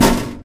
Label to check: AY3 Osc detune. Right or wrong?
Wrong